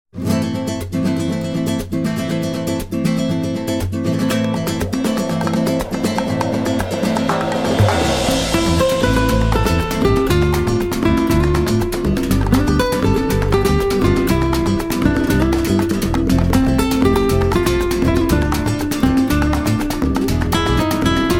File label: Latin Band; Washington DC